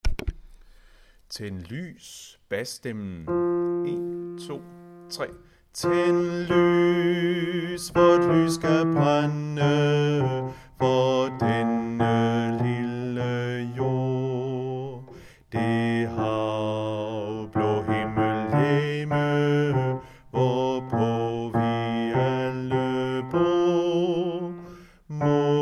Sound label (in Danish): bas; Tænd lys; harlev koret